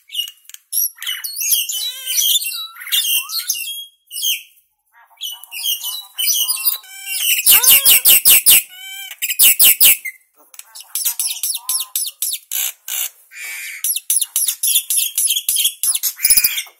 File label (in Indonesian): suara burung jalak putih full isian